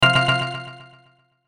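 Hit Mallet Percussion, Hit Mallet Vocal Pad Delay Low; Mallets; Blastwave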